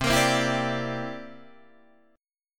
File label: C 9th C down Guitar Open D